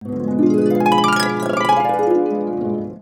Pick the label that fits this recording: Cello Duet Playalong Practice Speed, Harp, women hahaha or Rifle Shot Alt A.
Harp